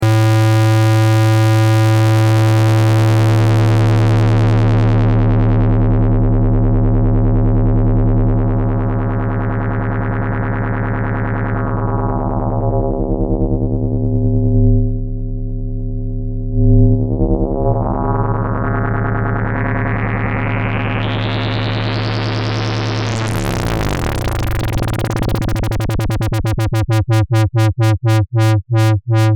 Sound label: SH01a Pulse LFO2VCO then LFO2VCO